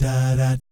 DAH DAT, Vocal Jazz FX; Spectrasonics; Vocal Planet; JAZZCOMBO

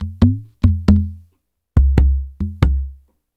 LQB WAVEDR, Liquid Grooves; Spectrasonics

